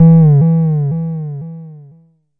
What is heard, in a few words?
Jomox MBase, drum machine; Jomox MBase 01